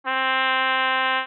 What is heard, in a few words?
trumpet